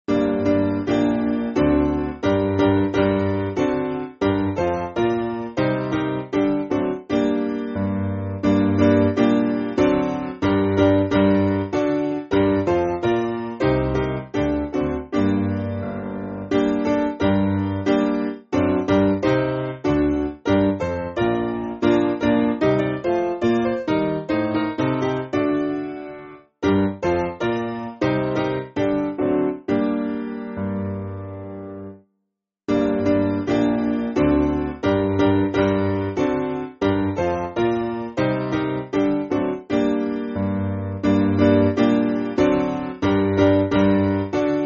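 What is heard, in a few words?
Piano, Music Backing Files for Churches; Guide Me; Bryn Calfaria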